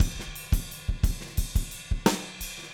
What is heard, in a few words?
ride beat, drumscores